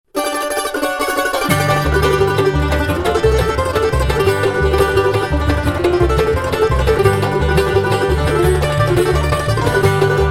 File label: Bluegrass Breakdown